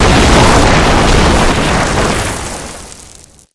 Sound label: dirt kick up